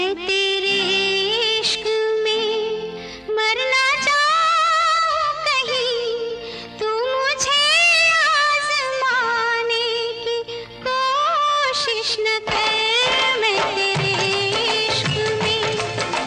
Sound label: Old Bollywood Hits Song Ringtone